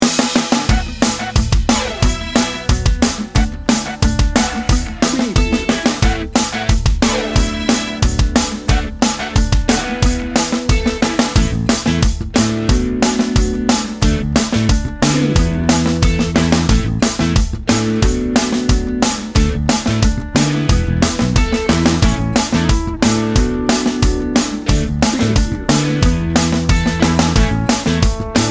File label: teen pop 60 second